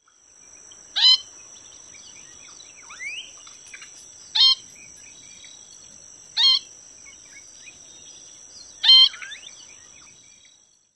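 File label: Least Grebe nasal calls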